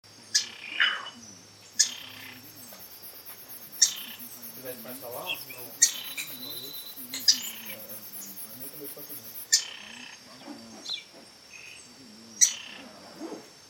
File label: Pinto's spinetail